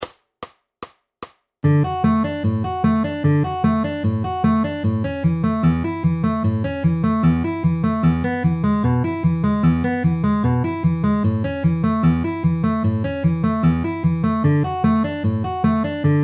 D A E A outer inner middle ring with alternating bass at 150 bpm